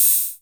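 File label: hi hat open, Korg KR-55B Drum Machines; 300 Drum Machines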